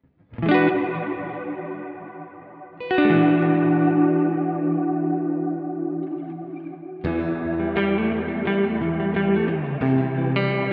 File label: Reverb Soft Focus; Keeley Realizer